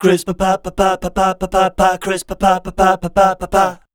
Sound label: SCAT #F, Voice